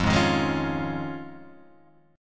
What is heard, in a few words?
F Minor 7th Sharp 5th, Guitar, Open G